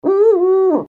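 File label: owl hoot; sovinoe ugu; soobshcheniia sms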